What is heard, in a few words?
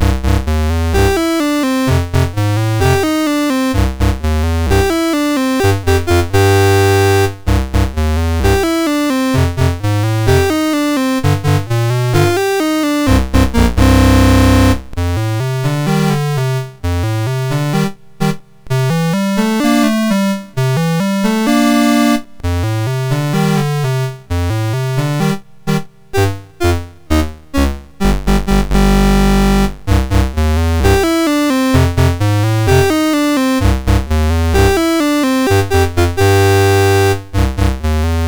Boogie, MOS6581R2